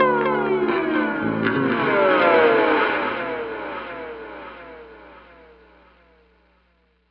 gliss tone, guitars; Big Fish Audio - Alien Guitars; GLISSENING

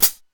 FOOT CL HH, HR16; East West; Global Sounds

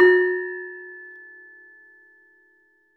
LAMELS F#3, Asian Traditions; USB Soundscan vol.60; AKAI; LAMELOFO

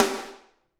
SD, Drums; Bob Clearmountain Drums I

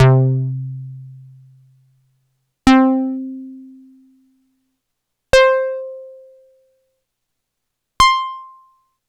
HARP, Synths

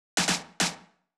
Snare Fill; Zero-G Sound Sense Grime Scene; GS Construction Kits; Just Flex

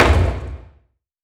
Orchestral Drum; M8; percussion and foley

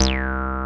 303 SQUARE; CMP - Analogon

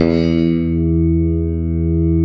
Strat Pos 2 E1; Mo' Phatt; Digital Sound Factory Mo' Phatt

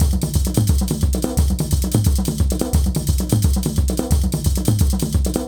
African Drum 75 bpm

